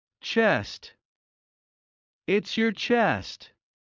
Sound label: Heart low; Classroom humanbody